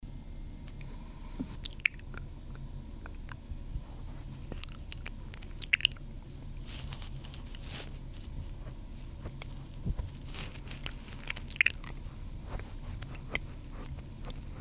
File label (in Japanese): 耳奥まで舐められる耳舐め